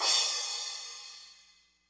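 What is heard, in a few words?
crash, Breakdance 172 B minor; Big Fish Audio; Hardcore Breakbeat Action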